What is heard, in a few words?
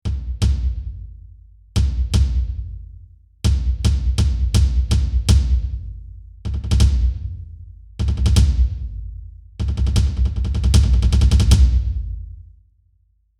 build up